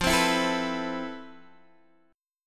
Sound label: F Major Sharp 11th, Guitar, Open G; down; chord shape